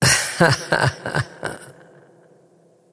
wolf laugh; dota; crystalmaiden

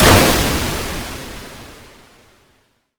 gush impact; tidehunter

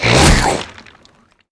goo cast; weapons; dota; bristlebog

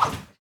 preattack; dota; marci

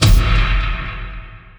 normal punch; weapons; dota; dark seer

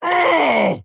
pain; axe

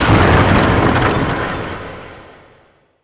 crystal clone destroy; weapons; dota; crystal maiden

